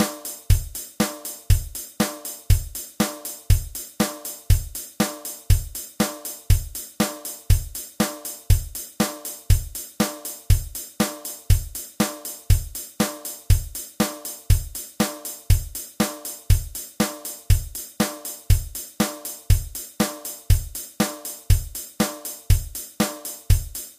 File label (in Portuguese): Baterna Punk Rock; Curso Baterna; Aula 1